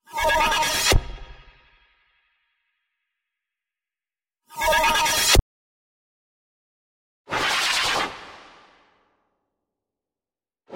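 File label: time machine or teleport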